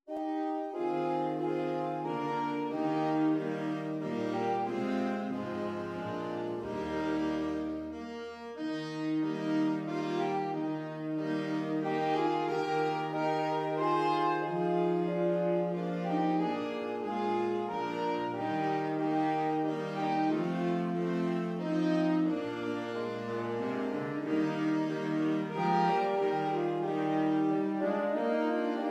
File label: Sax, saxophone quartet; Away in a Manger